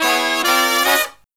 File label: Brass Riffs